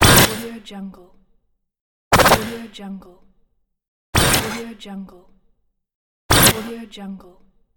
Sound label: robot malfunction bursts